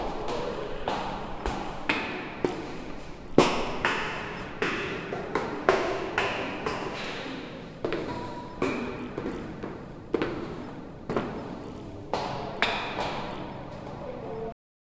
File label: hard workout on gym